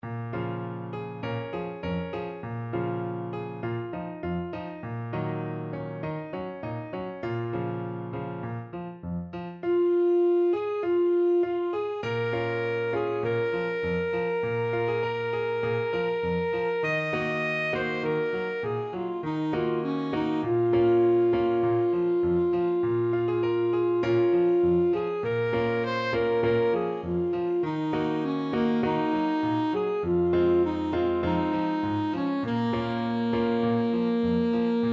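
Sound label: yu bu sa huahua alto saxophone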